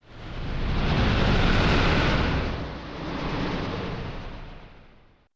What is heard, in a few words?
Whoosh; Learn to Fly 2; Pacifist